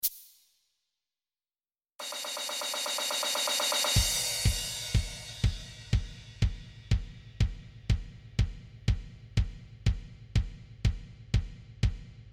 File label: Drums